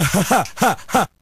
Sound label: ah ha ha ha ha; Voice; ooh ahh